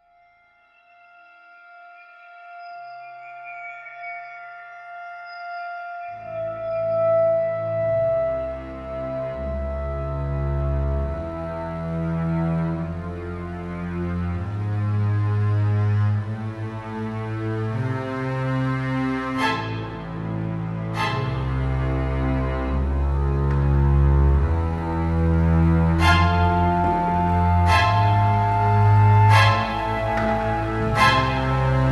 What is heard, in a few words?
orch twist dark build, soundtrack